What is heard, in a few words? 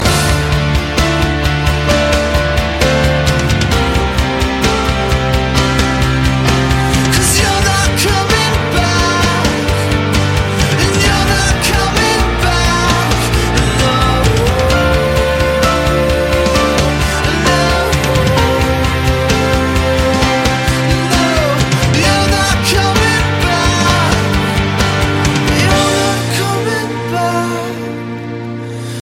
english song instrumental phone tone ringtone